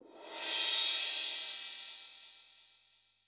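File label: Reverse Crash; drum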